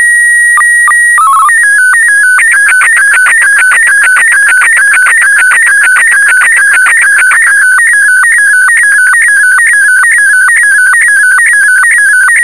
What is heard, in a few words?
sstv